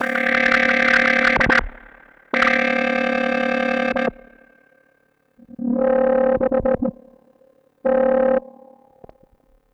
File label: Odd Modular; radiosonics